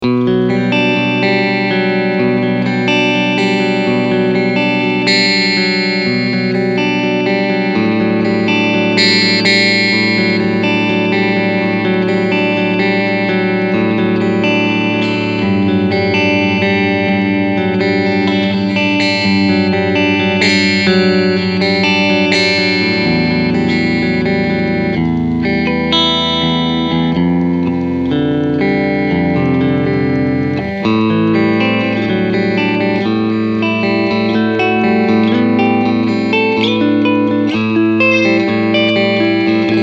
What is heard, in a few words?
Position 4 Clean